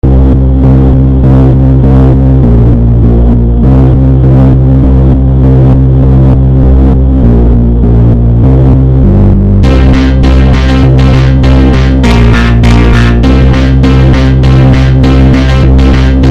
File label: TYPE BEAT 200BPM